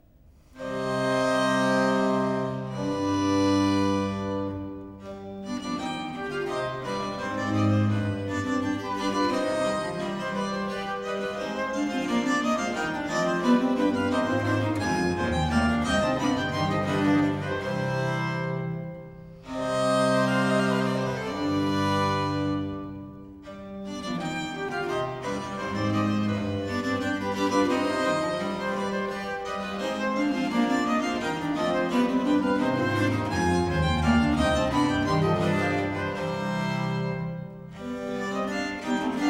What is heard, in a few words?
consort sett a 5 in c minor ii. aire, english music for viols